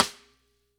marimba drum; ゆめ2っき

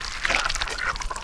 Blood Splatter Sound

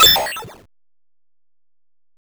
warp; ゆめ2っき

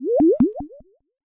water teleport; ゆめ2っき

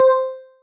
marimba; ゆめ2っき

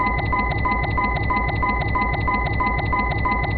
glitch; ゆめ2っき

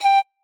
marimba child; ゆめ2っき

